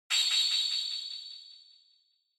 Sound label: Flash High Delay; Onoma